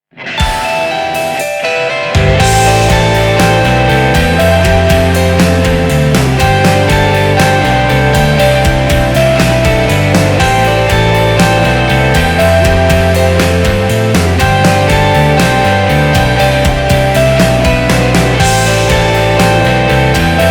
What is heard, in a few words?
off vocal